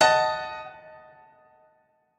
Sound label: piano